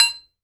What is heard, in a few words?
IRONWARE, IRON WARE HIT; AKAI S6000 CD-ROM - Volume 6